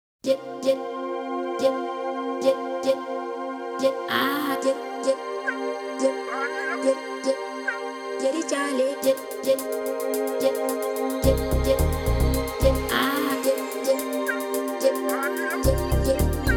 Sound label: Soft Radio Version